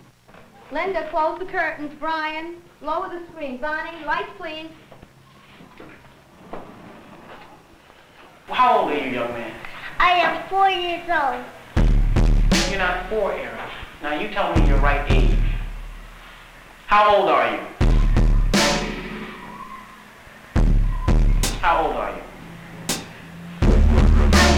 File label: beat tape